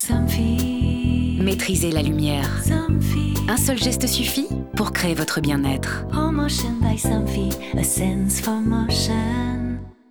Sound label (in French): Chant Pub; Somfy